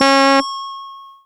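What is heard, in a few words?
Yamaha DX7 Brass Chime C4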